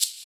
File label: Shaker; Equipped Music; Loungin House